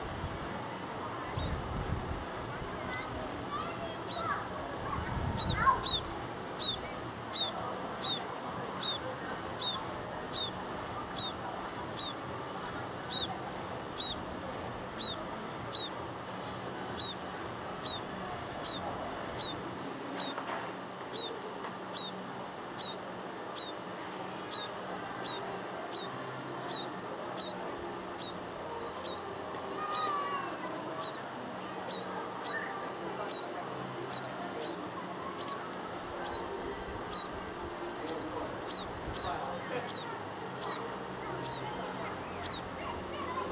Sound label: Scaly breasted Munia Lonchura punctulata topela